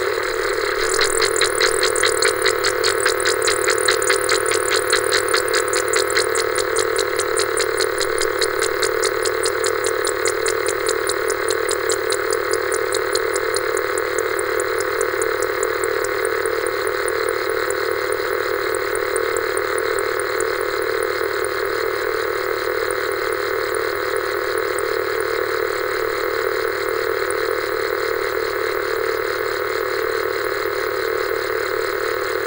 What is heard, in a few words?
Analog TV Noise Modulation Hum Sweep; The Soundcatcher